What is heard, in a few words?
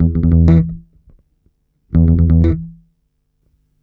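ALP BASSE, 122 bpm; USB Soundscan vol.32; Roots Disco; AKAI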